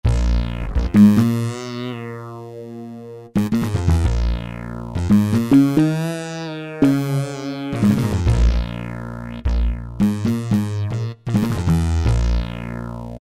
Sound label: Mut Bass, Kurzweil K2000; Kurzweil K2000 Contemporary ROM; Contemporary ROM Programs and Demos diskette